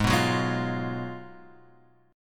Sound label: G sharp Minor 13th G sharp, guitar chord; G#m13 Chord {4 2 4 1 X 1} strummed